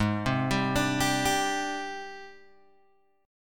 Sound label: G sharp Major 7th Flat 5th, Guitar, Open G